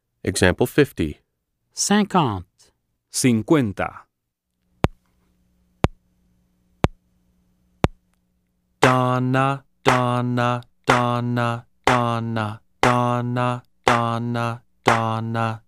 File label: Keyboard